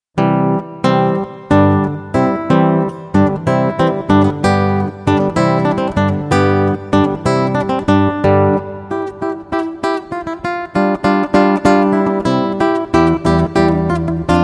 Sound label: Dance, Guitar; Water Music, Handel